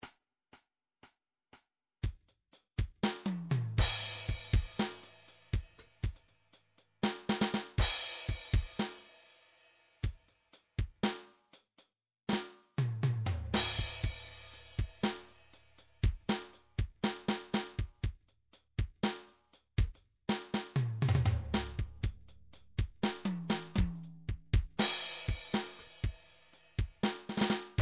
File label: blues